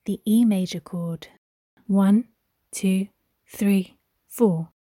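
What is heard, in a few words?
organ, E major chord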